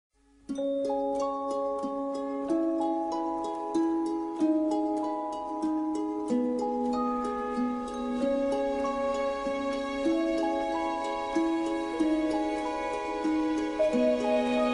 fairground ringtone

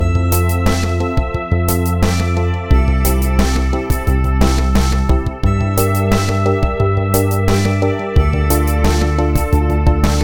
pleasant music ringtone

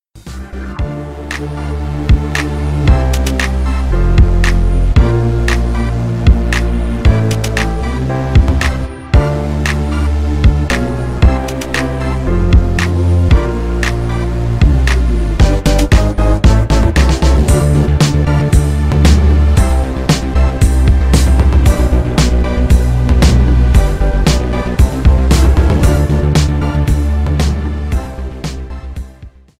instrumental ringtone

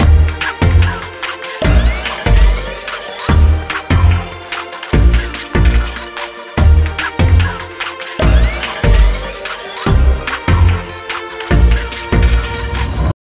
chorus ringtone

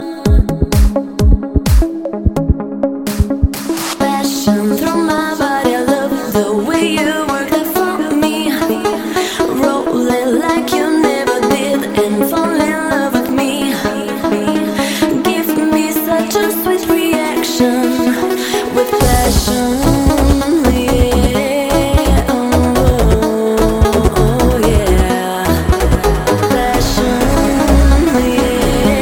house club hit cool ringtone

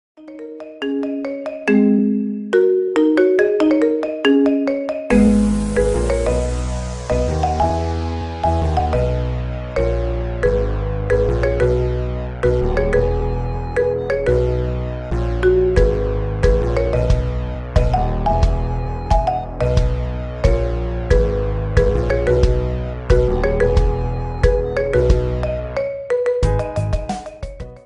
marimba remix ringtone

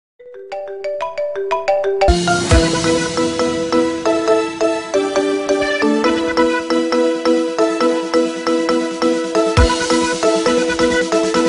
marimba remix ringtone

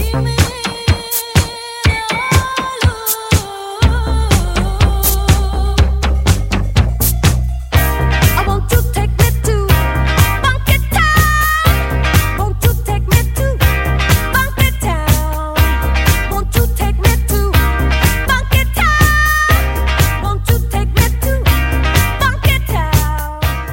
ringtone

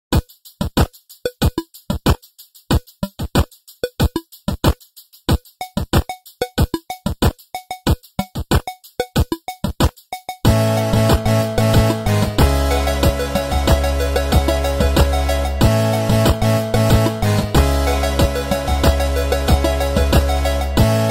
8 bit ringtone

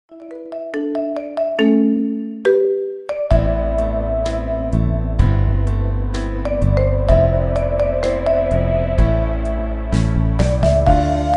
marimba remix ringtone

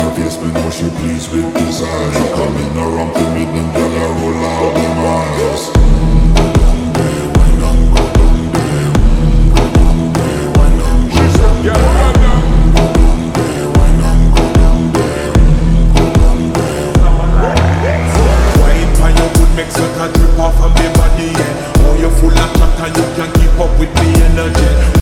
slowed ringtone

